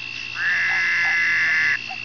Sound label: east texas toad call; texas nature trackers; amphibian watch